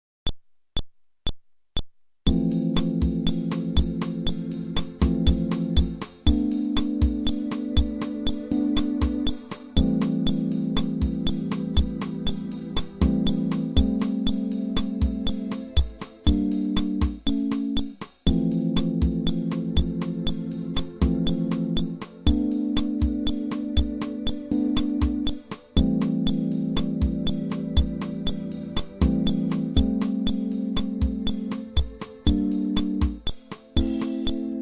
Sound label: Groove ベース無し